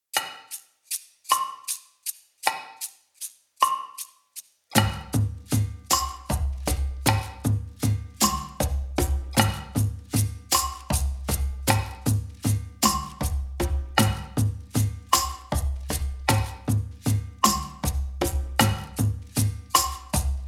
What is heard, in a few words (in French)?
Boucle ternaire - Tempo 52, Accompagnements Tutti Drum's; Agostini